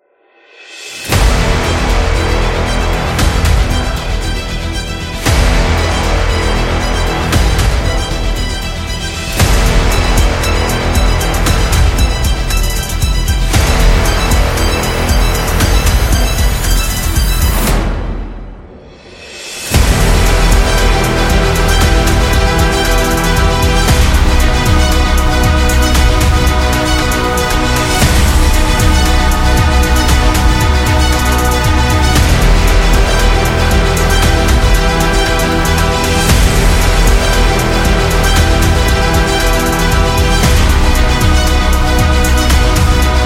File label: Epic Beat